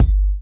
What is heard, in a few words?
BD; Best Service; Dance Mega Drums